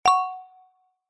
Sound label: multimedia notification bright metal tuned mallet ping hit; zapsplat